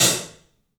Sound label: HAT, 3-D Percussives; E-MU Producer Series Vol. 5 – 3-D Audio Collection; P.A. Kit